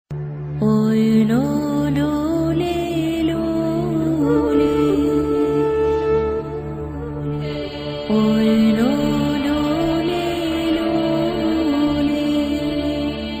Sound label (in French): la musique